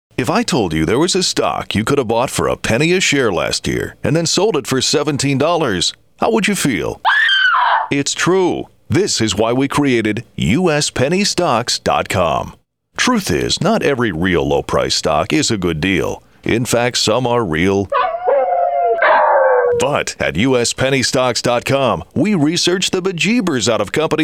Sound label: Person Screaming; USPennyStocks; spots